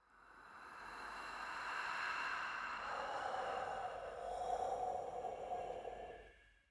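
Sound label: breathing; pantocrator video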